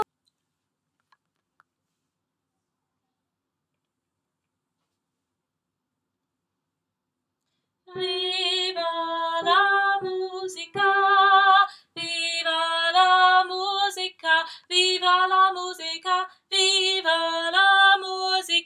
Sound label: Alto